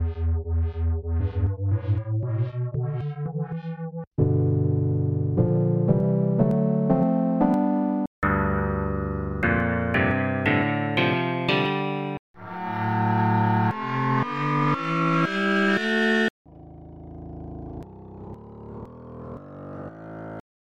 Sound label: E Blues scale, ringtone